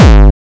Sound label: hardbassdrumz, breakcore; Bazzdrumz